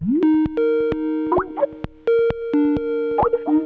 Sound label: Call Ringing In normal; Skype